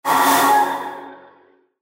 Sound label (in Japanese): 薬草